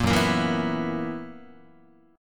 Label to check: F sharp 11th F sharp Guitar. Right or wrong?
Right